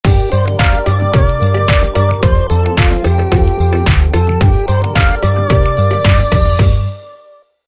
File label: Minor Mix; Sony Ericsson; PlusFourSix; Ai (W880i)